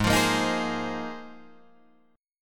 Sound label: G sharp 6th Add 9th G sharp Guitar Drop D